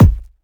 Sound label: present bass drum single hit a key, Royality free bass drum one shot tuned to the A note